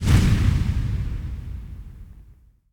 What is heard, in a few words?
Fury Charm Burst; Hollow Knight; Hero Fury Charm Burst